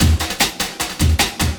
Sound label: LOOP, 150 BPM, Drum & Bass